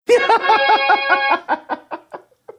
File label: laugh in fanfare